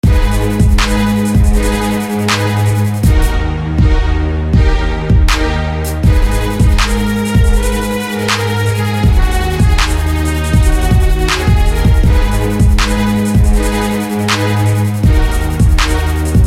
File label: String Blazing; SFB FX